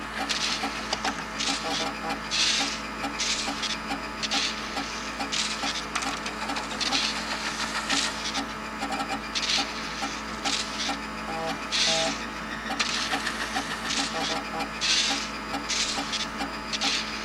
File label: fabricator utility; Tools; Barotrauma; EK modern